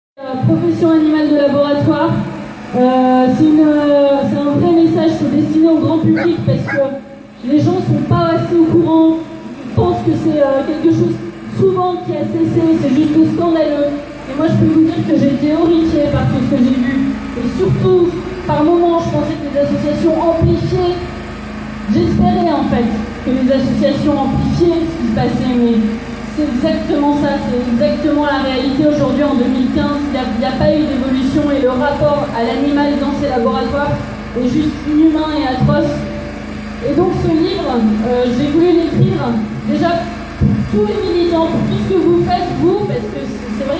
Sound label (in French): Manif vivisection; Manif vivisection Paris 2015